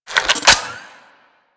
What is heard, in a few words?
Microwave Pistol Reload; Grineer